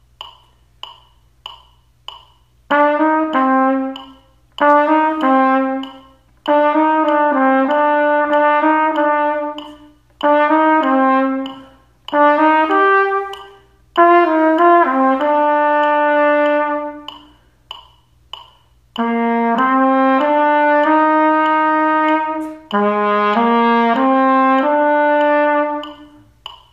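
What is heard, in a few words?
trumpet only, quarter note=96; Play-a-long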